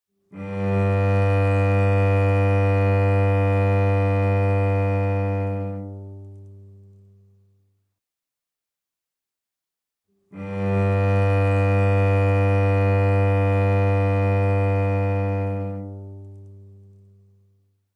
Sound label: Tuning note G, cello